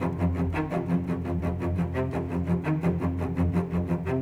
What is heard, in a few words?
Cello, Orchestral; Sonic Foundry (Sony Creative Software); Orchestral VOL-2